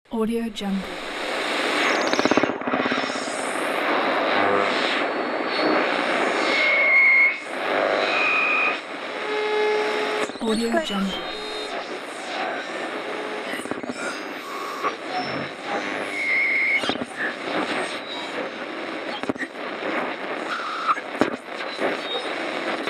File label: radio tuning